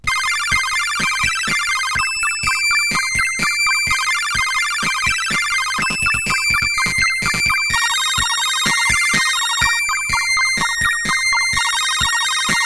CSG8580R5

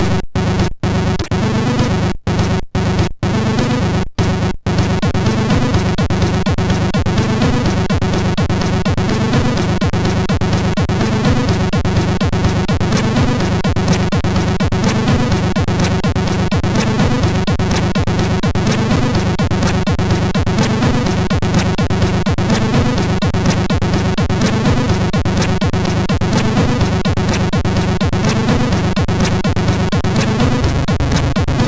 sid MOS6581R3, GAMES; Cisco Heat

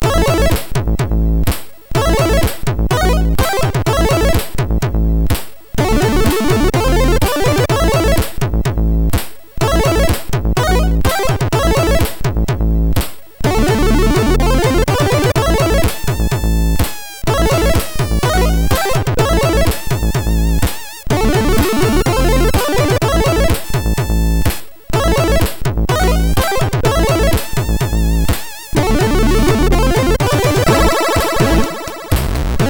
Song, MOS6581R2; Oua Oua Song

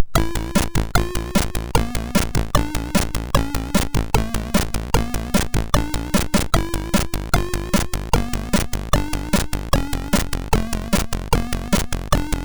sid, MOS6581R2

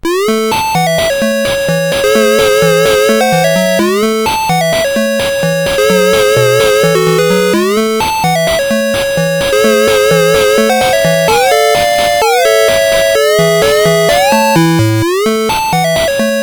Musicbox, sid CSG8580R5

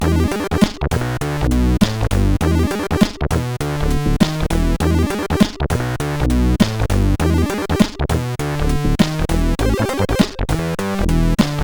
Blues, CSG8580R5; Spike Super Blues Experience

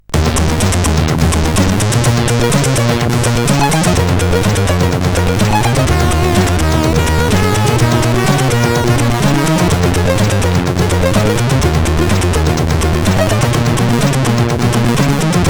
remix, sid CSG8580R5; Action Please

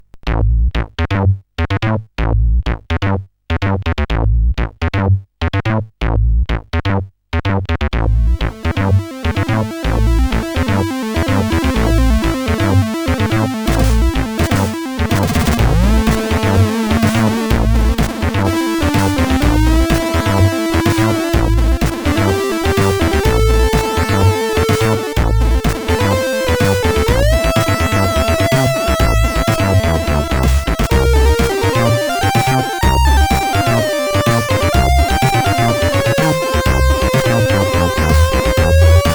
Cheese Funk, CSG8580R5

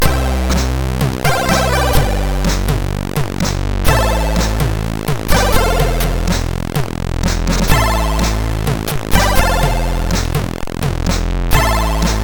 Short Tune, MOS6581R3; hvsc

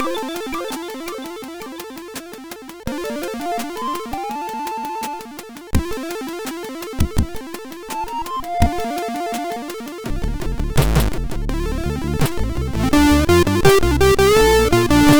Funk, MOS6581R4; Love

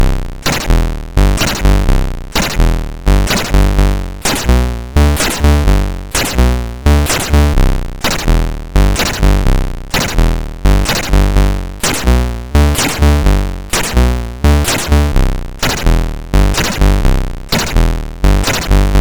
Bas, MOS6581R4; Source SoftRunner Group

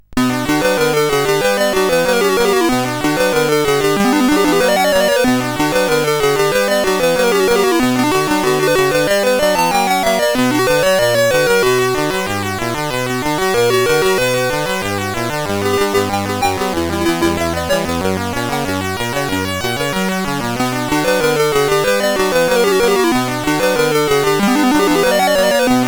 Classix, sid CSG8580R5; The Dungeon Master

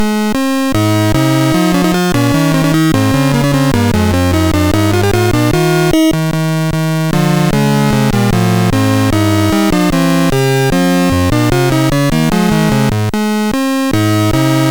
Dragons Den, MOS6581R3

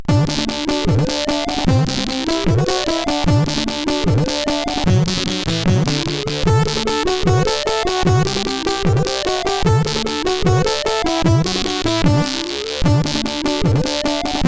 Jingles, sid CSG8580R5; Deek

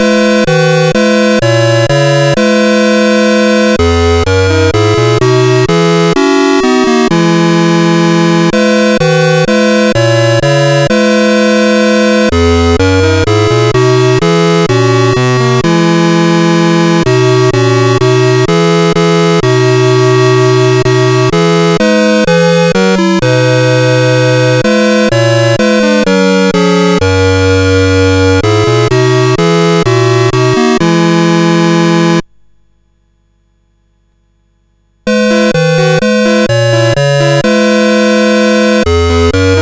Gezang 135, CSG8580

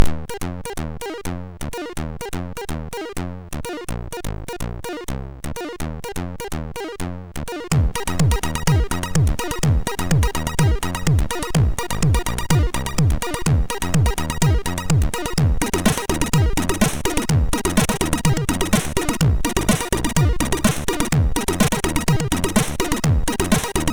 Be Happy, MOS6581R2

